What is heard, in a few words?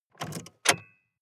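sports car interior key pull out short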